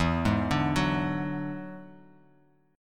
E 9th E down Guitar Open F; E9 arpeggiated; JGuitar

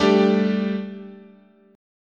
G Suspended 2nd, guitar chord

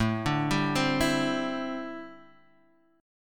D 7th Suspended 2nd Suspended 4th A

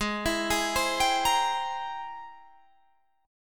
G sharp Augmented Major 9th G sharp Guitar Open D

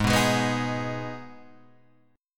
G sharp Minor G sharp down Guitar Open G, chord

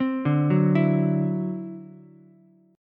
D 9th D down Ukulele C6; arpeggiated; JGuitar

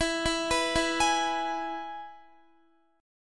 E-Major E, Guitar; arpeggiated; JGuitar

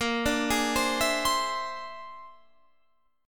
A sharp 9th Flat 5th A sharp; arpeggiated; JGuitar

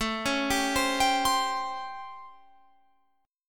A 7th Sharp 9th, Guitar, Open F; A7#9; arpeggiated

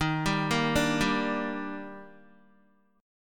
Eb Major 7th, Guitar; EbM7; arpeggiated; JGuitar

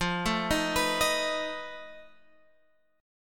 F 7th Flat 5th F down Guitar Open G

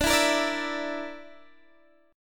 D Suspended 2nd Sharp 5th D Guitar DADGAD; strummed

